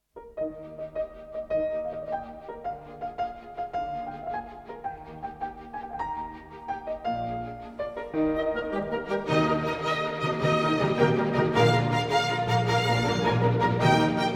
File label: Piano Concerto No 22 Rondo; Mozart; Symphonic music